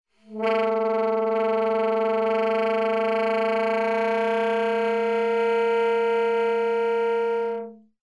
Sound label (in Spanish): Grano